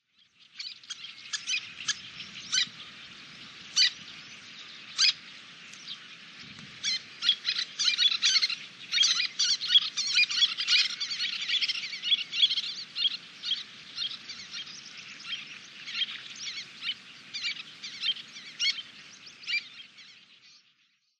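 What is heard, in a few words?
koajisashi, Little Tern; koe; watarase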